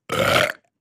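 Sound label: Large Stinky Airy Belch, belching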